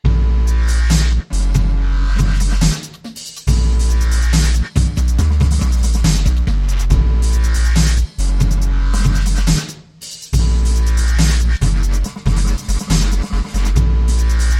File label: DUBSTEP